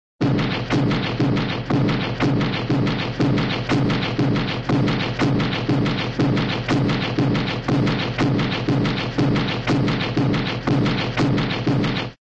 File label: press, cartoon large wooden printing